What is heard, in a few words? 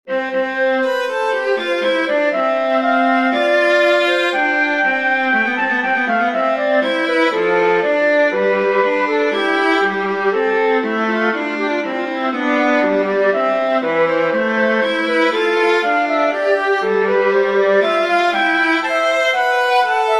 march major for flute viola; purcell henry